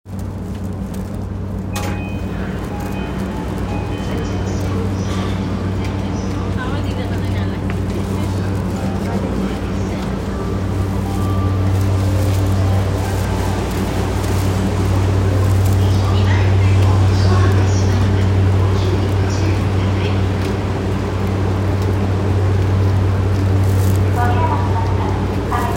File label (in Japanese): JR 山手線 巣鴨 CCW, train sounds